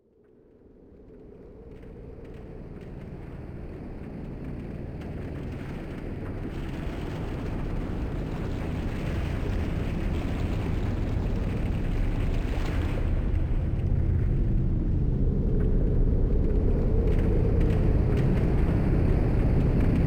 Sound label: Desert Noise